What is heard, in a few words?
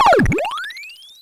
Drums, Hits; DCM8